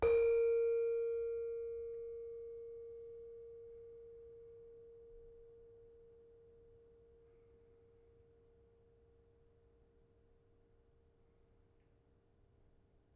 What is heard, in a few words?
Saron soft, gamelan